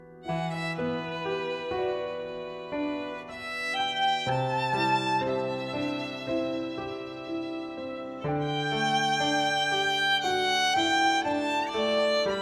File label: Perfect Violin; Electronica